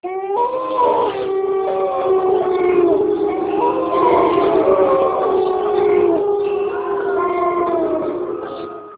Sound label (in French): loups